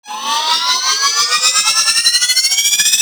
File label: Defense Speaker Charge Up; Warframe; Nineteeen Ninety Nine